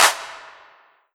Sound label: Yamaha TG100 Hand Clap